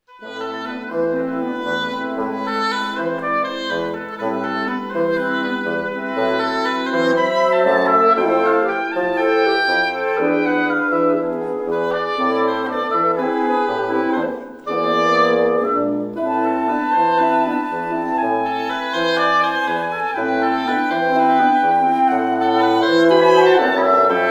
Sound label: Dance suite for Wind Quintet